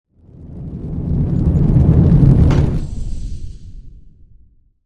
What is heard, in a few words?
pad Land; Mindustry